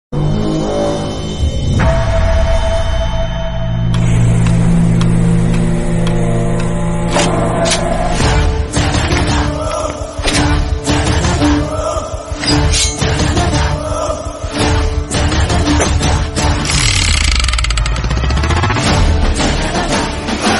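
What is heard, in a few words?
background music Ringtone; AP Arjun; Dhruva Sarja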